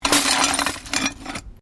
Glass shards movement

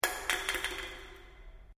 Can Aluminium Inside Drop; various metal cans; Drops; Objects

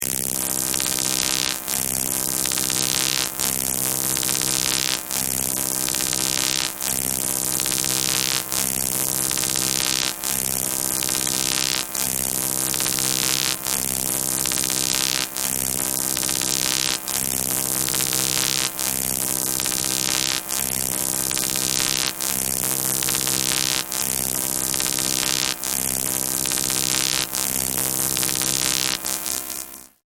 Static, Buzzing static electricity; Sound Design

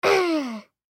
Human Groan; Groan Male Six Yrs Boy; Pain; Blastwave

